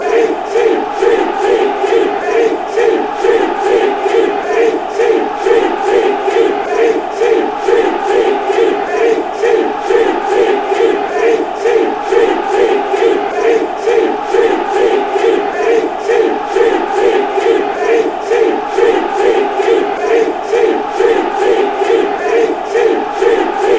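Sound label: cheat swansea, football chants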